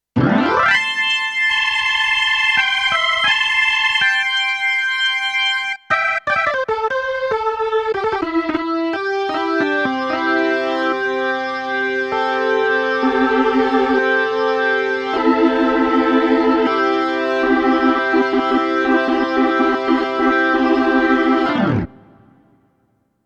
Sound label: Organ; Jon Lord